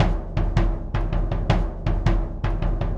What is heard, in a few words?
Big Bass Drum; Sonic Foundry (Sony Creative Software); Joe Vitale Latin Percussion